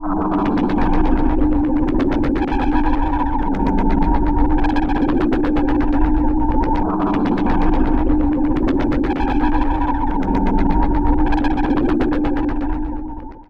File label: Spooky Shaft, Ambient; Zero-G Seismic Frequencies; 90 s Sample CDs